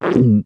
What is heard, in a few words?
eating sick; MiniGames